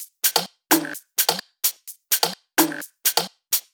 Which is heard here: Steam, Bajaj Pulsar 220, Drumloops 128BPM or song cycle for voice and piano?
Drumloops 128BPM